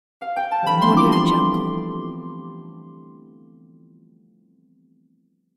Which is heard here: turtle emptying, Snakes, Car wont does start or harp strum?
harp strum